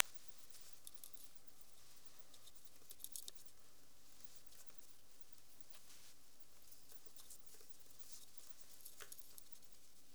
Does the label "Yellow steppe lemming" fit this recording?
Yes